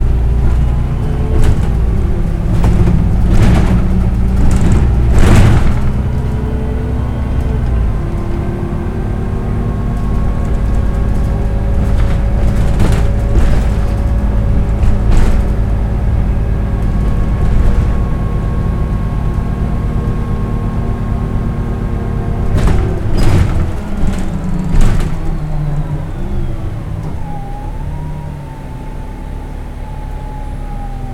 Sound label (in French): bus; place leclerc